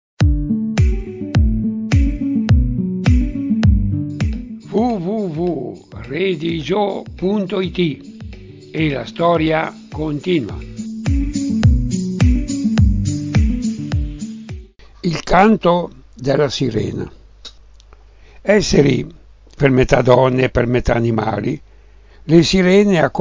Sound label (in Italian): canto sirena